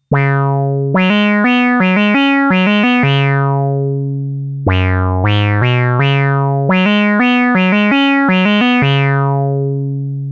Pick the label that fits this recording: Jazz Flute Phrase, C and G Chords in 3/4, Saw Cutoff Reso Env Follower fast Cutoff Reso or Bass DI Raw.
Saw Cutoff Reso Env Follower fast Cutoff Reso